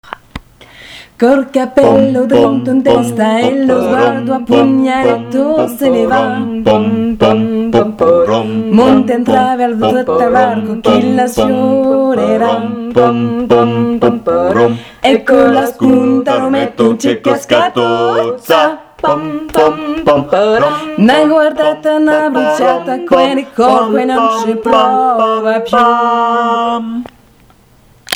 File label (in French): tango couplet tutti